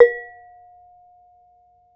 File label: Bonang, Gamelan